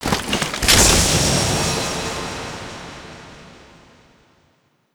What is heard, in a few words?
chest opened; cstrike; pubg